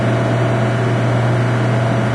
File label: communications room ambience; ambient